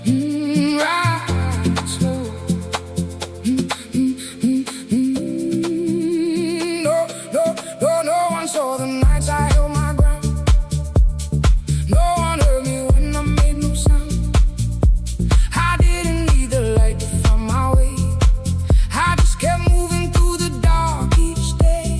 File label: Deep House Music